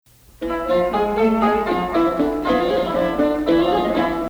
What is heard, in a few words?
Hijaz